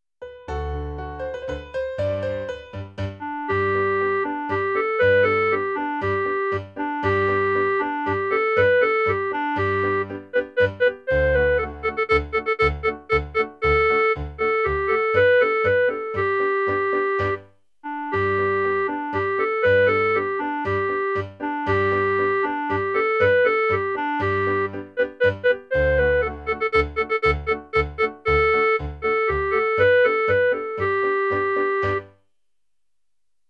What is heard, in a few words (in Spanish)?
Popular aragonesa CLARINETE; musica II ESO 2015